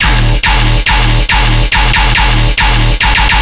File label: Bass Loop D# 140BPM, Dubstep